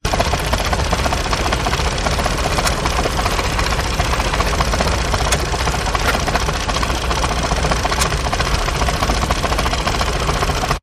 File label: Film Projector Spinning Tape